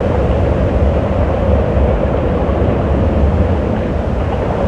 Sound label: cave wind; avant gardens